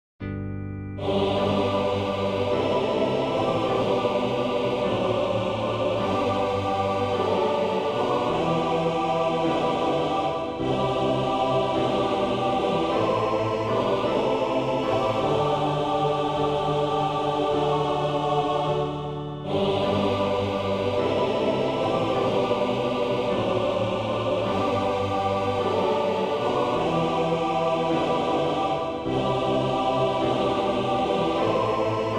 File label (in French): Psaume, a 4 voix en sol majeur; Psaume 130 (Hebr. 131)